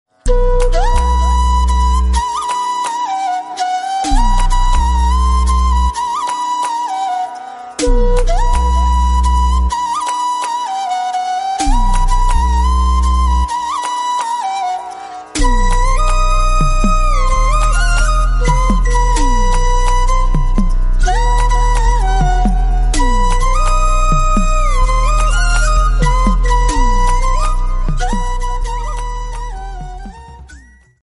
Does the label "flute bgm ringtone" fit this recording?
Yes